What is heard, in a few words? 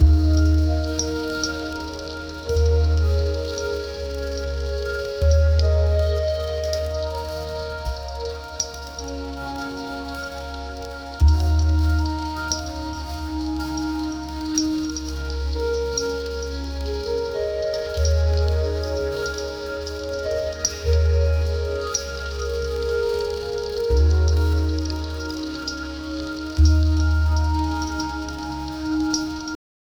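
a short refined lounge music, lounge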